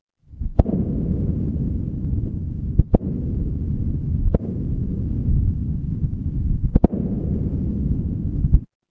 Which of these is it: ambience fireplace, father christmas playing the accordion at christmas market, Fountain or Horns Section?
ambience fireplace